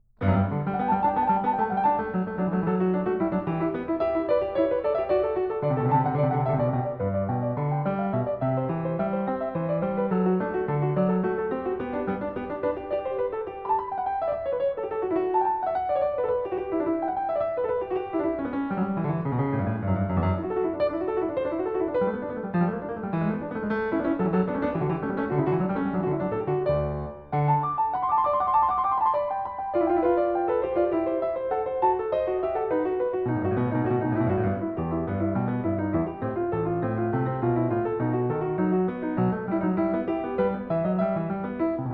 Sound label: Clav. (Binaural); Bach